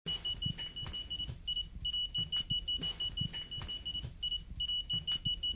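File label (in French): sonnerie avalanche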